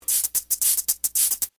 sitcom transition bass riff; seinfeld

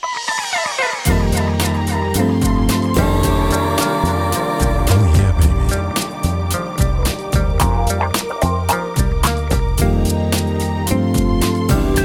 extended romance music; smg4

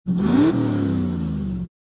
car sound

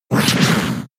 scratch

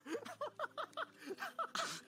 laugh

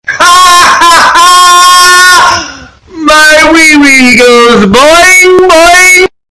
wee wee bass boost

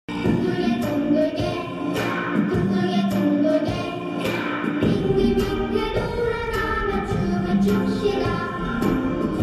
with echo sound

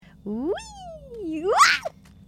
whee wah

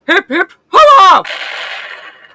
hip hip hurrah boom

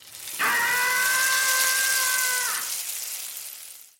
sizzle scream

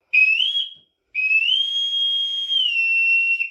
boatswain pipe general call

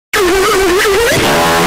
fart loud

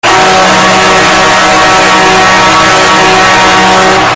goal horn; detroit red wings

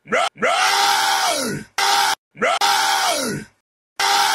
glitchy raaaa

